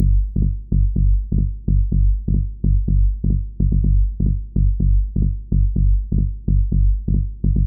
Tech House Bass Rhythm Punchy, G, Loudest frequency 82 Hz; instruments; loops